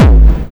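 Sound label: hard bass drums; Bazzdrumz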